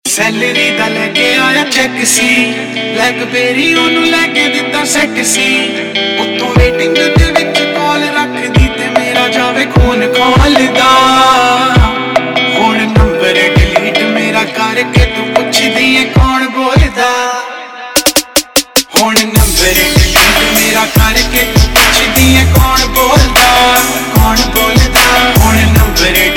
Rnb Version; Punjabi Pop Ringtone

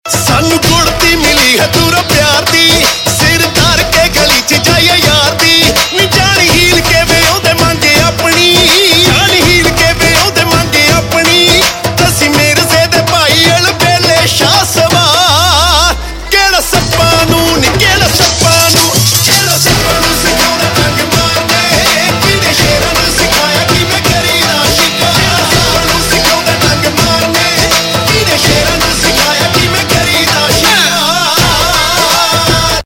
Ringtone, Punjabi Pop

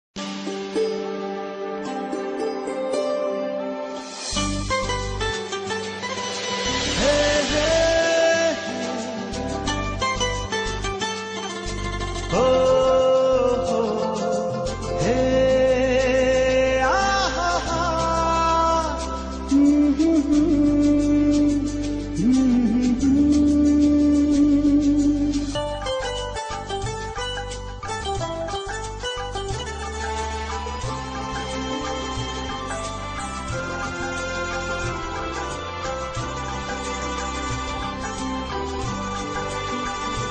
Instrumental; Tv Serials; Star Plus; Diya Aur Baati Hum

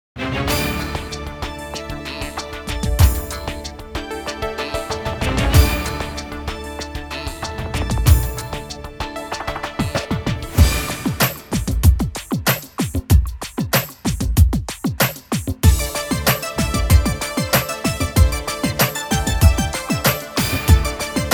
Funky Mix; Miss India (2003)

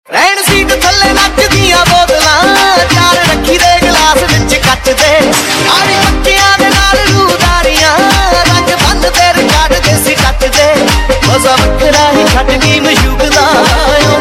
Ringtone, Punjabi

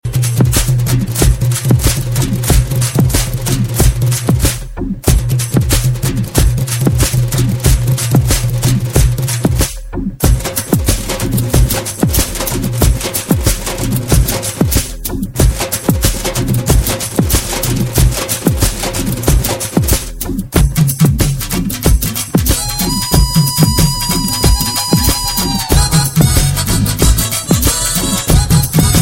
Indian Street Beat

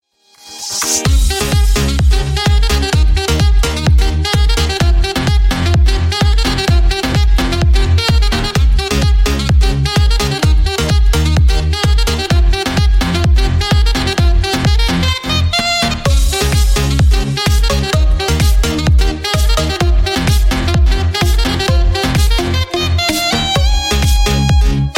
iphone ringtone; Pop Ringtones

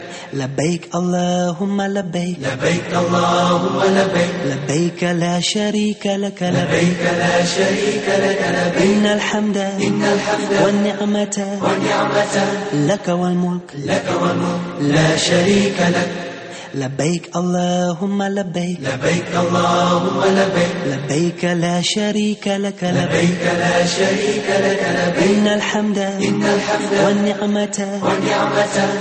Labiak Allah Haj; Islamic Ringtones

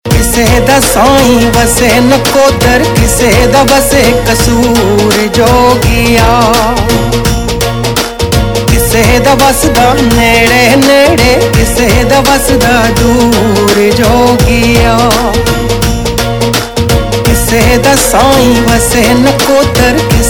Ringtone, Punjabi

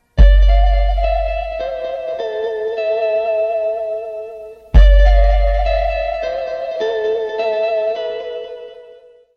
Space Sms

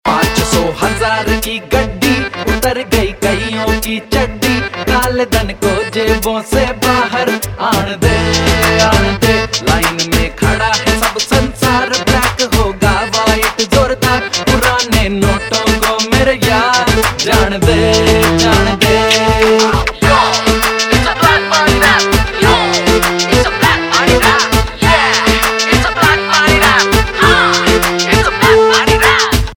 Rap Ringtone

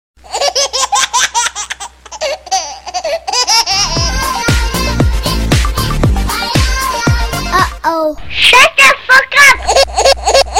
Mix Baby Rap

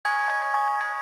Sms Tone

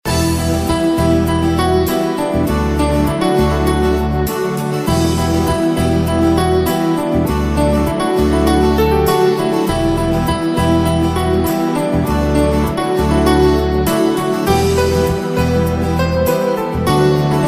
Instrumental

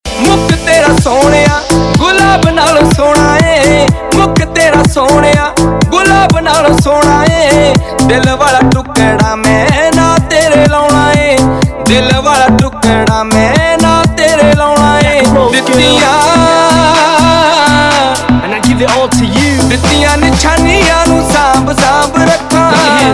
Ringtone, Punjabi

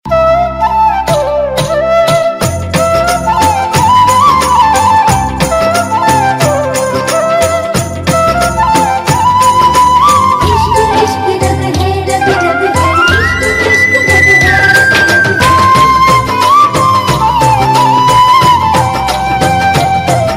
Instrumental Title Tune; Kis Desh Mein Hai Meraa Dil

